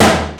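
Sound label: processed tom, drums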